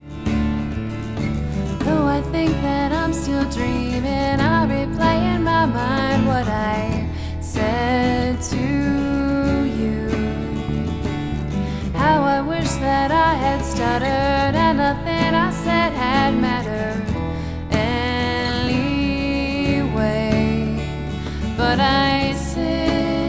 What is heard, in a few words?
vocal delay reverb